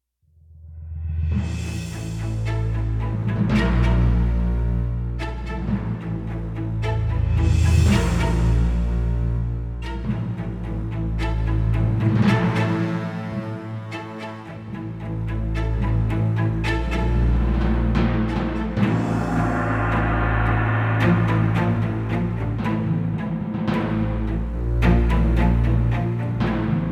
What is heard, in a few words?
Dangerous Theme TENSION LOOP